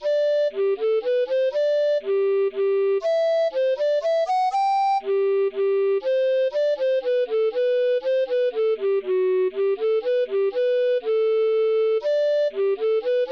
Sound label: Minuet in G, recorder